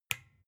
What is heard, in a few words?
desk lamp off soft